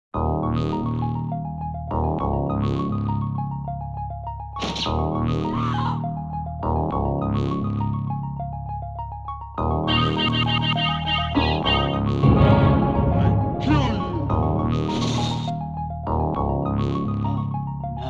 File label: UP STAIRS; The Ninja Warriors